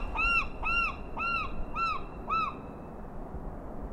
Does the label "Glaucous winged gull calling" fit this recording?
Yes